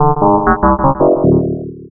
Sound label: alien signal